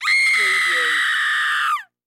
Scream Long Female; sound effects bible

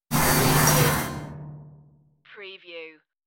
electric starship door

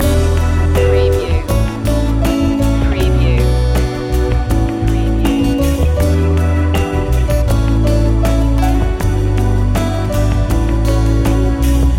time to relax loop

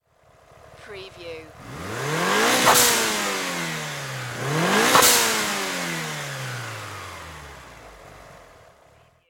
MITSUBISHI EVOLOUTION ENGINE DOUBLE REV

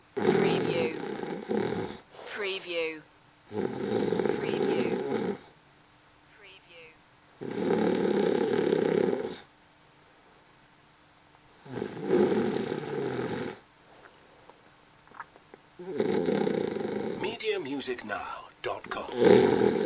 Snoring, Female Snore

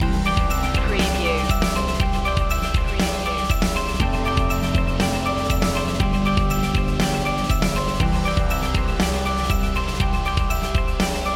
corporate innovations loop

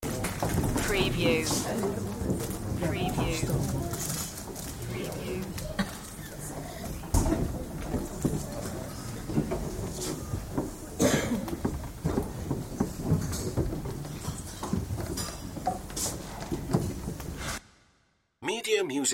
Audience Chatter Crowd Ambience; beanstalk audio

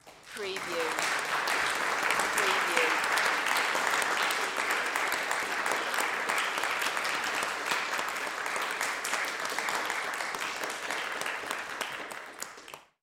Applause Auditorium; sound effects bible

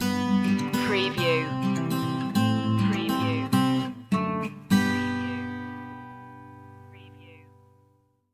our happy place stinger

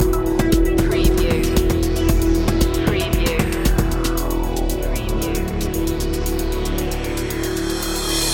media tech loop fill

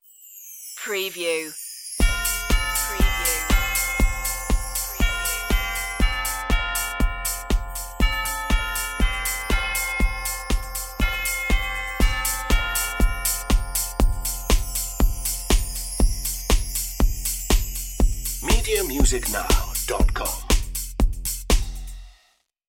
auld lang syne synthified intro ext drum layer

